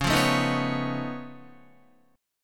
Db Augmented 9th Db down Guitar; Db+9; strummed; JGuitar

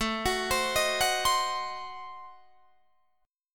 A Diminished 7th A down Guitar Open D

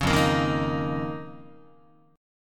F 7th Suspended 4th Sharp 5th F down Guitar; F7sus4#5; strummed; JGuitar

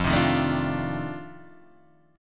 F 13th F, chord; strummed; {1 3 1 2 3 1}

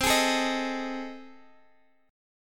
A Minor Major 7th Flat 5th

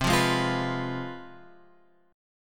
C Major 7th Suspended 4th Sharp 5th, guitar; CM7sus4#5 Chord {8 8 6 X 6 7} strummed

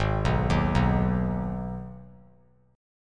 G sharp Minor G sharp down, chord; G#m arpeggiated; JGuitar

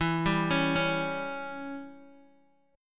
E Minor 6th E, Guitar Open D

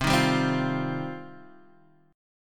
G 7th Suspended 4th Sharp 5th C

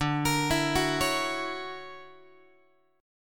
D Augmented Major 9th, Guitar; 4A,4E,5A,5D,5G#,6C#; arpeggiated; x,10,13,14,10,12; JGuitar

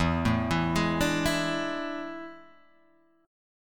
A Major 7th Suspended 4th E

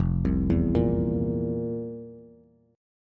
F Major 7th Suspended 4th F, Bass; FM7sus4 arpeggiated; JGuitar

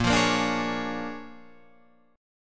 A sharp 7th Sharp 9th, Guitar, Open D

